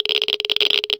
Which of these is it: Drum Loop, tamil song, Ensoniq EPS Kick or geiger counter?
geiger counter